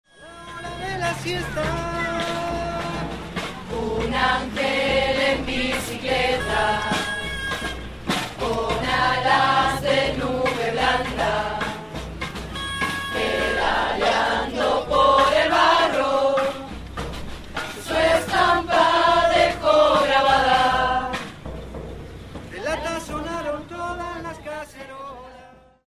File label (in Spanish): parques murga ensayo; parques de españa; Rosario